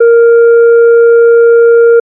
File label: fm sine